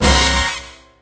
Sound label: Level Popup; pepper panic saga; android ios